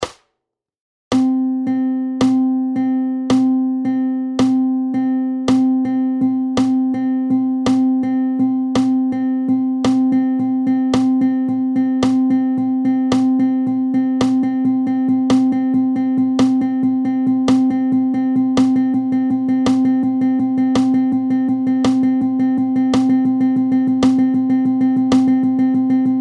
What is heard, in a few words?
Rhythmic Subdivisions, guitar; onlineguitartuition